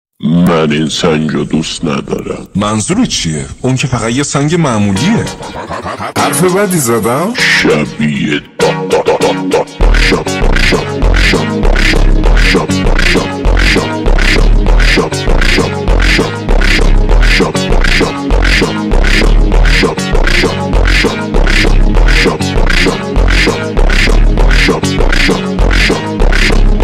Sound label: FUNK slowed